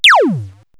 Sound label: laser